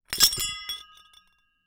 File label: Hole Saw Rattle, Hole Saw Bits